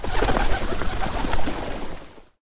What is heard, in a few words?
duck intro